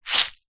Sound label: wet cloth squeeze rinse